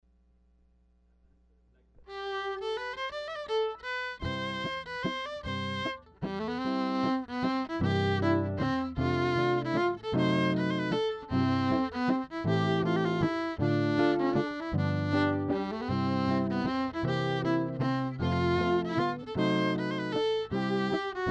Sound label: Waltz C; Valses